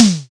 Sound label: sharp steel snare drum sound A key, snare sound tuned to the A note